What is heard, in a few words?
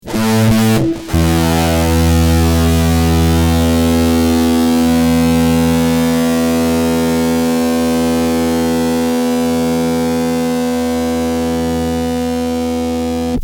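fuzz guitar dry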